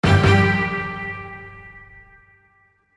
Small Victory; luigi's mansion 3

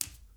Bare Foot Step

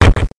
Hit; hydra castle labyrinth gamerip 2011

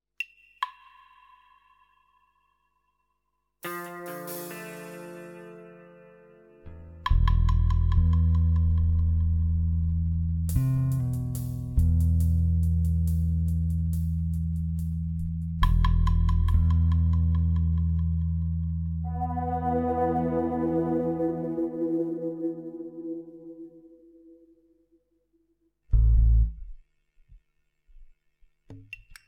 tudor ambience; Worms 3D

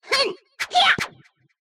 double slash; Mystical Ninja Starring Goemon; sasuke

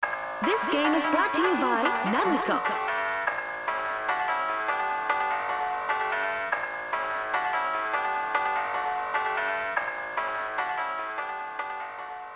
Boot Up; super world stadium 98; namco system 12; arcade

